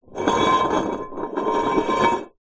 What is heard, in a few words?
household cup metal camping slide wood counter; zapsplat